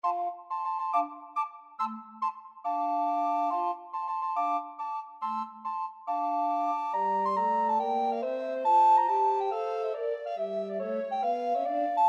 recorder quartet

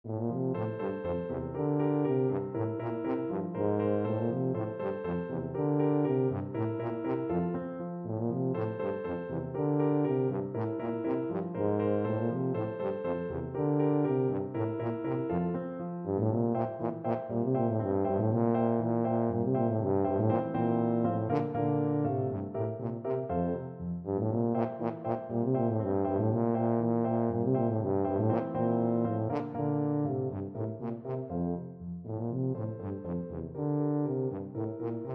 ecossaise beethoven, tuba

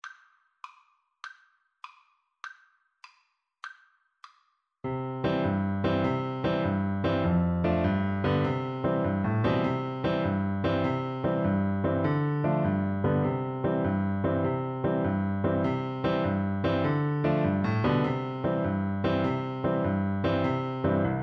itsy bitsy, xylophone, Percussion; English Traditional

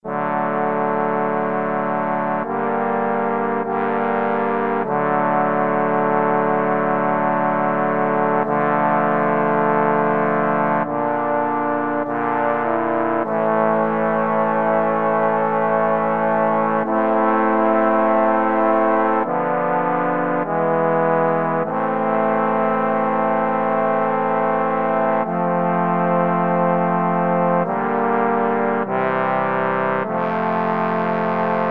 trombone quartet

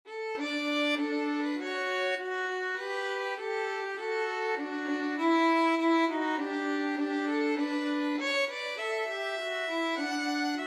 national anthem russia, violin duet

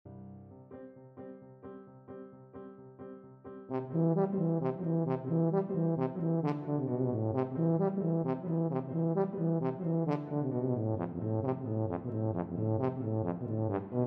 bartok romanian folkdance5, tuba

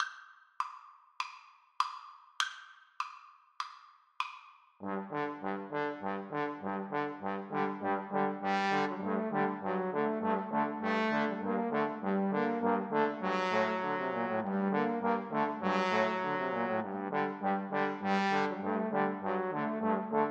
trombone trio

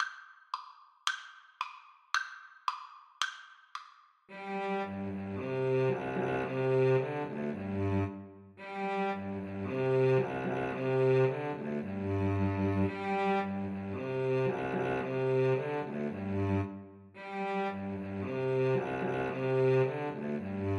mandolin cello, Mandolin-Cello Duet

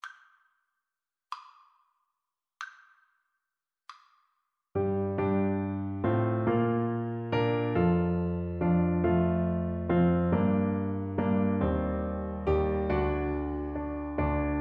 what child, Tuba

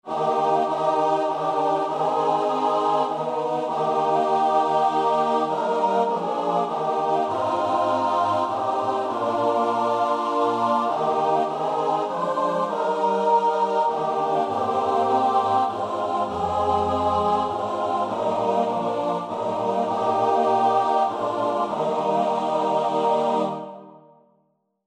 choir

